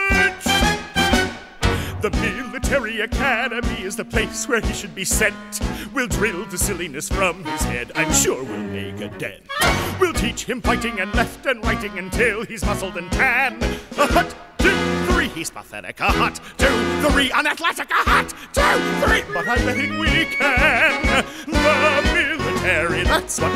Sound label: Military callback vocal low; spring musical